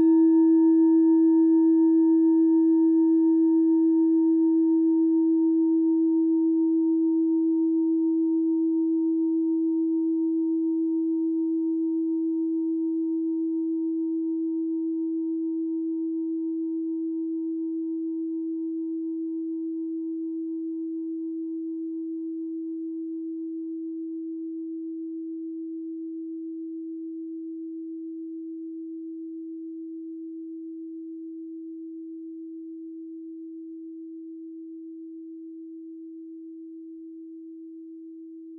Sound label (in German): klangschale set; Klangschale Nr.7; Gewicht = 620g; Durchmesser = 14,7cm; Höhe = 7,6cm